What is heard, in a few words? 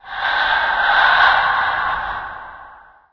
Ambient